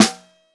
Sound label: SN1 Bottom, snare